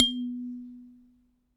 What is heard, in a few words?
Aluminium Tube Hit